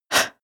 female breath fast out

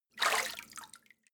step water run out

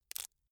bamboo small break soft

